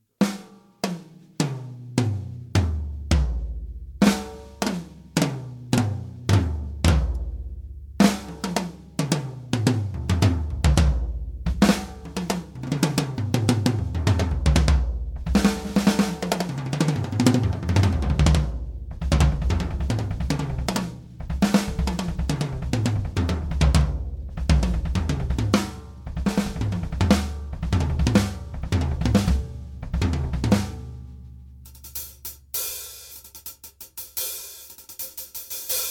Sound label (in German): C414 OH solo Toms und einzelne Becken; C414 - nur Overheads - Toms und Becken einzeln - mastered; Glyn-Johns